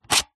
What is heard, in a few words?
leisure toy stereoscope plastic disc pull out remove